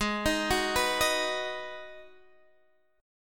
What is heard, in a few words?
Ab Minor 7th down Guitar Standard; arpeggiated; JGuitar